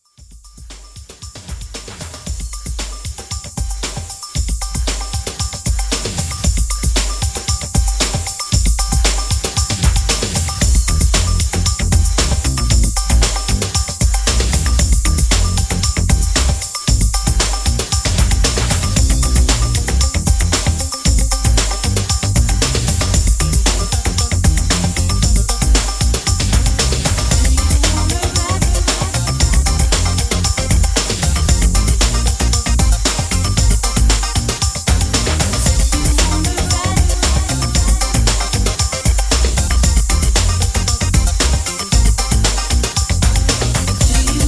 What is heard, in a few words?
80s Re Drum 115